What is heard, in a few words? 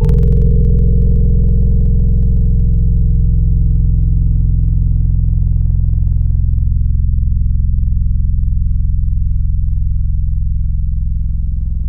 Rhodes Classic; Instruments; Synthetic's Serum Collection; Echo Sound Works Core Tables